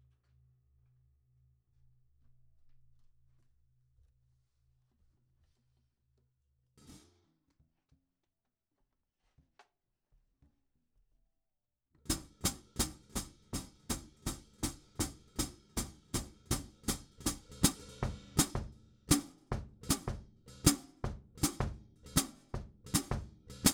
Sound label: perc bridge hat